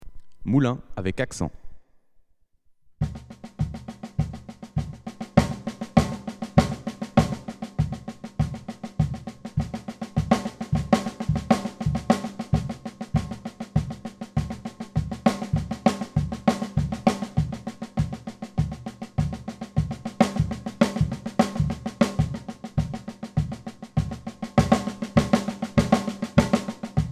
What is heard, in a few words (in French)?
Moulins avec accents